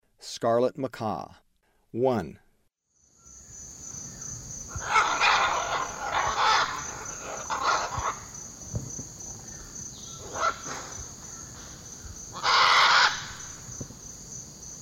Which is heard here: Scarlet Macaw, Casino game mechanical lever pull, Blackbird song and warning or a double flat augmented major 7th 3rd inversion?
Scarlet Macaw